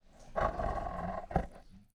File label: tiger snarl; prosoundfx